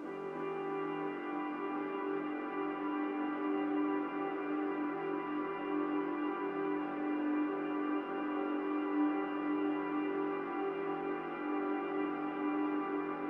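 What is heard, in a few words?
pad long cmaj; ambient or nature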